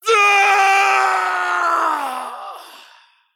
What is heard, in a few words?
Male Human Death